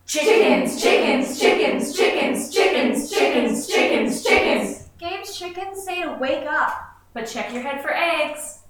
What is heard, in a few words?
chicken alarm